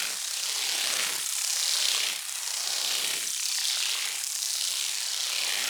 Pelt Shed Deactivate Particles; Warframe; Dragon

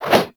Melee Swing; Warframe; Caliber Chicks Minigame

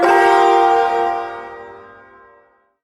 Shawzin Blues Chord; Blues Chord F; Warframe; Duviri Ersatz Shawzin; Chords

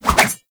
Pole Arm Spin Whoosh

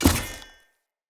Short Sword Throw Medium Impact Wood Hollow Large

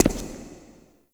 Shadow Figure Steps

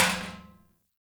Metal Trash Can Impact; Ambience; Gameplay; Warframe; Nineteen Ninety Nine

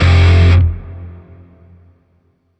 Shawzin Yo Chord C; Music; Warframe; Grineer; Chords

